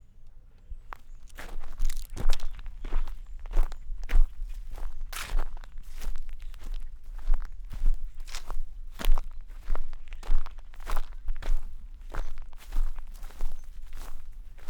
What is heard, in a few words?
Footsteps on grass rocks